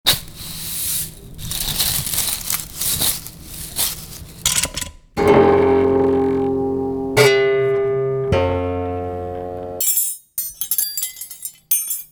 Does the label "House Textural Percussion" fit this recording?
Yes